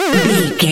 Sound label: retro teleport warp effect